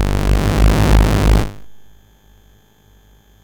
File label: sid MOS6581R4; Movie Monster Game